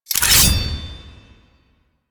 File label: Blade Release; Cinematics; Shrine Intro; Warframe; Sacrifice; Umbra